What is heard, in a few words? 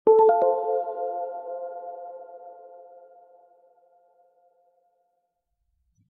Notification